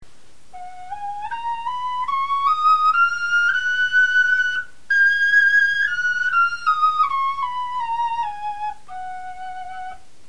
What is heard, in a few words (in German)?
tonleiter, knochenfloeten